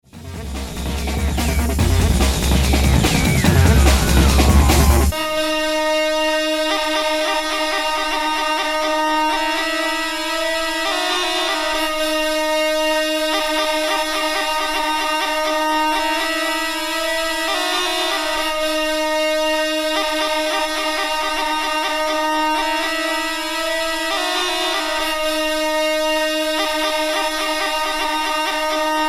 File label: Horns